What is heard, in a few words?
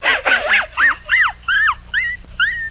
laugh